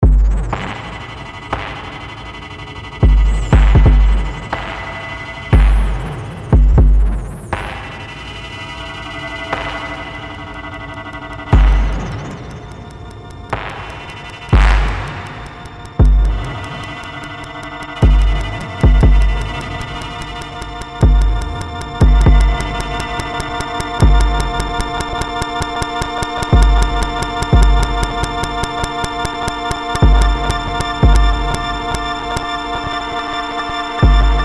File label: bass grains, a new music piece composed with sounds created only with GRAINS; bass drum grains